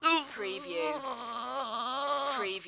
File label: Grunt Long Strain